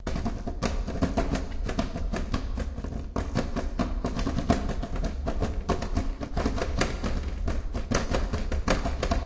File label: wet tap dancing failed; DELTARUNE